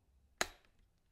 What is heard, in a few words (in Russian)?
zvuk vkl el chainika